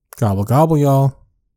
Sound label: Gobble Gobble